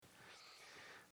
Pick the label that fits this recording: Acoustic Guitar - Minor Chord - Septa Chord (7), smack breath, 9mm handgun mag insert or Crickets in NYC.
smack breath